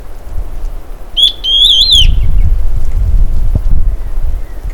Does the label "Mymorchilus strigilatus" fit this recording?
Yes